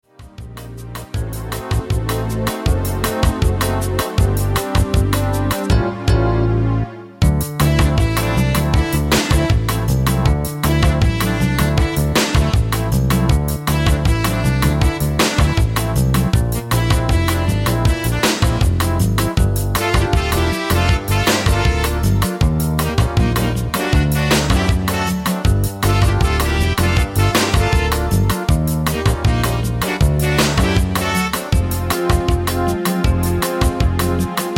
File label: Karaoke